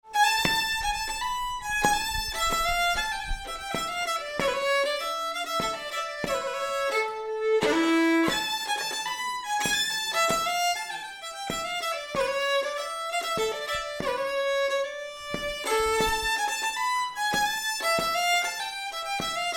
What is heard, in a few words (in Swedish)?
Polska; fr Ore